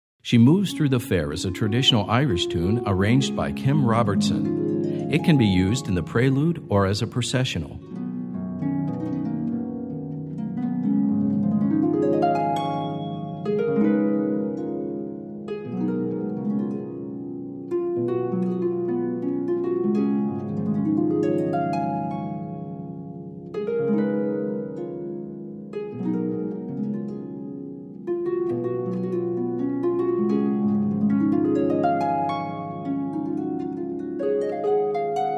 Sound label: She Moves Through the Fair Traditional Irish, Harp